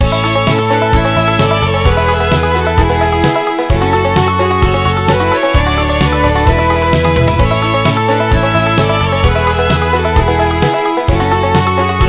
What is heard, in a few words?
Banjo Bayou Serenade; the muppets on with the show